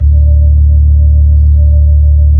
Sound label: B-3 Chorus